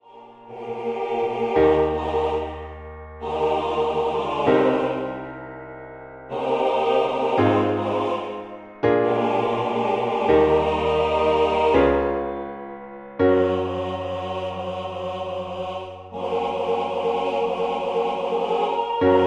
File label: MIDI SATB